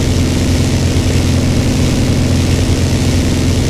leo2a5 engine rpm load, tanks; engines; War Thunder

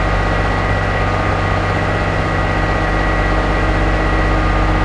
chieftain engine int rpm 33 load, engines tanks; War Thunder

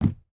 footstep burlap wood jump land; Rust

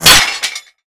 impact metal; weapons; Modern Warfare

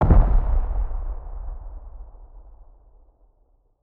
c4 explosion distant; Rust

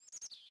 birds; World of Tanks Blitz; hangar blitz

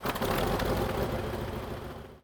start engine, engines; World of Tanks

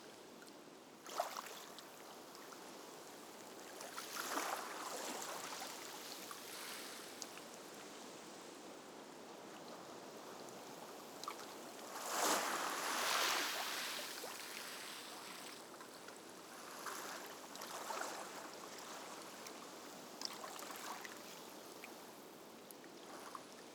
ambience shore calm; Rust

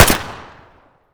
grach fire outdoor close; Escape from Tarkov

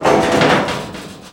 chop debris lift; Call of Duty Modern Warfare

